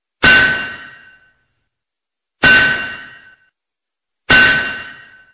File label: metal nail sound, Hammer And Nail